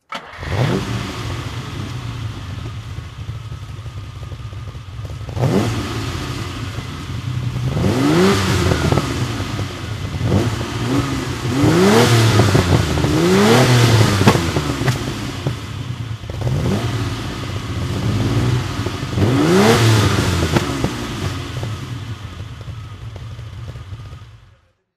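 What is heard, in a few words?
ferrari 330 gt 2+2 throttle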